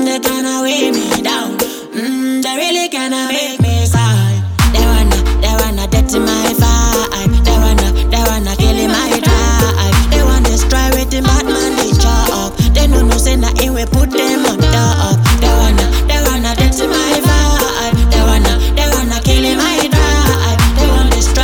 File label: Dirty Vibe